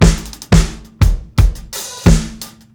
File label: 87 FILL, drums; Basement drums vol 2